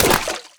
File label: land water, footsteps